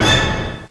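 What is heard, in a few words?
orchestra hit; Crypton - DrumBase5000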